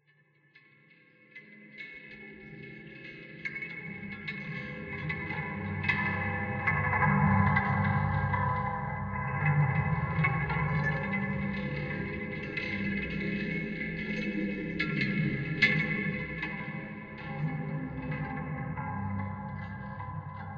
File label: Woodhits Sample